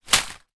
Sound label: impact small branch leaves fall hit ground; zapsplat